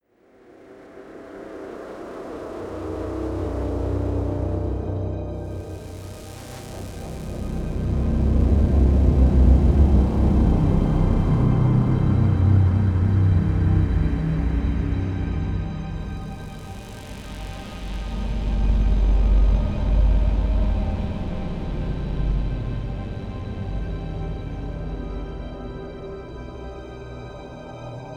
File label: drone strings versus synths